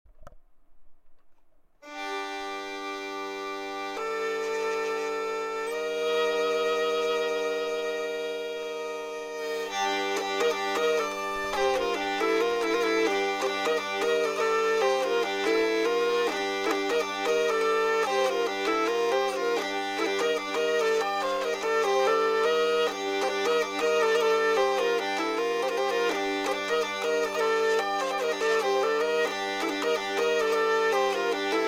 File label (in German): bordun